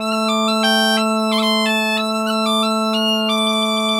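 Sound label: bagpipe 90, Celtic Flavours; USB Soundscan vol.42; AKAI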